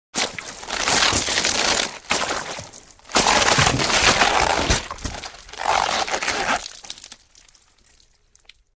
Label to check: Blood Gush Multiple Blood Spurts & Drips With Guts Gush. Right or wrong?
Right